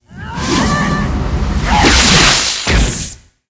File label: dark mage attack